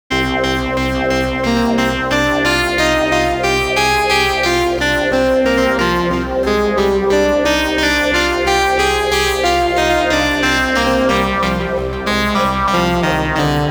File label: saw and choir seq; the digital life